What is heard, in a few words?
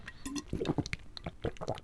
Canteen Drink; Civ4